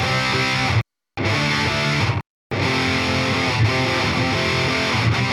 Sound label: Guit; Metal Zone